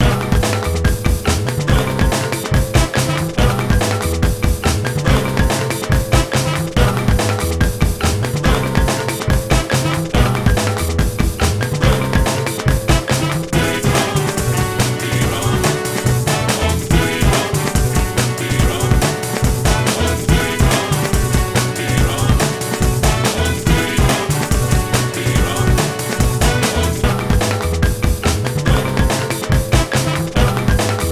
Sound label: bgm sea battle middle boss